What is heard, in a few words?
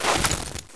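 crossbow draw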